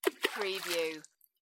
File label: Rock splash